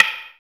STICK HIT; TEK PERC2

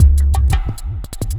Break Beat, Drum N Bass; Sonic Foundry (Sony Creative Software); Knutrix Drum & Bass

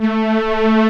SYN Stereo Soun; Best Service Gigapack 1; SYN 14

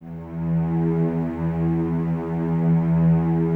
Cellos E 2, String Ensembles; Miroslav Vitous; LINKS ORCH

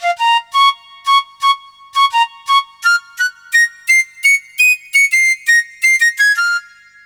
Flute

